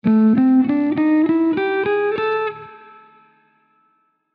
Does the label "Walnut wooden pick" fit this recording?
Yes